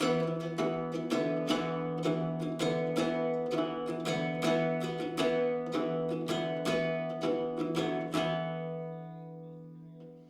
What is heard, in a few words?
bard instrumental; Assassin's Creed Valhalla